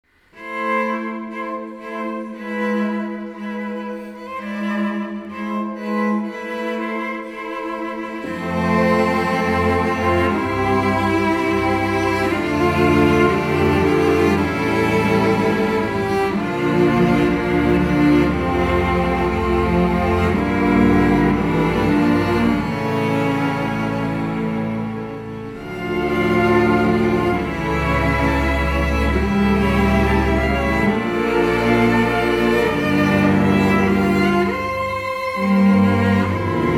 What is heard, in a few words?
Cello Symphony, Chamber Symphony